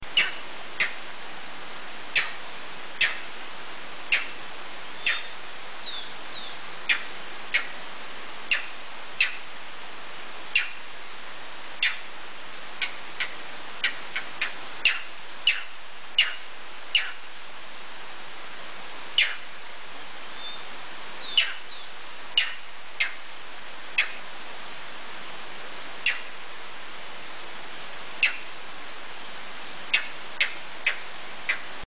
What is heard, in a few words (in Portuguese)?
socozinho